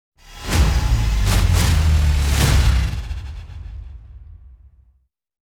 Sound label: Ramp Up; Tokyo